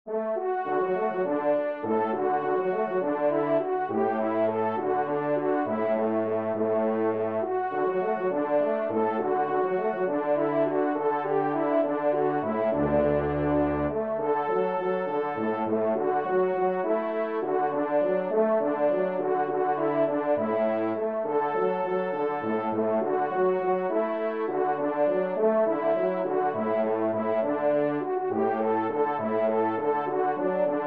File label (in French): Valse Do Maj TRP